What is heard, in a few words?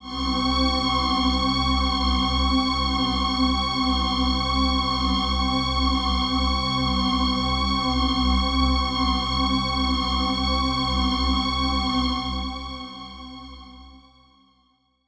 One Shot Drone C, dystopian drone; One-Shot Drones